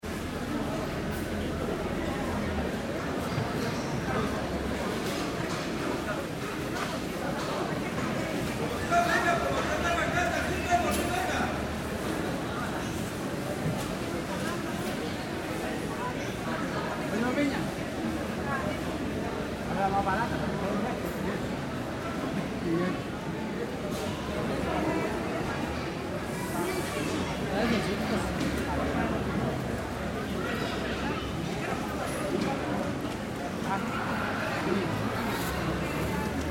Crowd Market Costa Rica Background Shout Outs; Stores And Mall Sound Effects; Crowd Market Costa Rica Bg Shout Outs

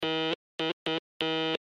Morse Code X Letter; Blastwave

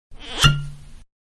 Wine Cork, Wine-Cork Out Of Bottle; Corks; Bars

